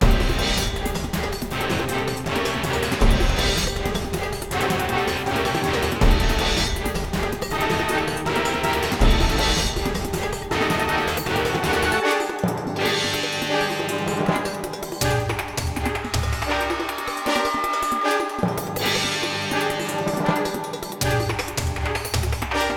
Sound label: Base Battle LOOP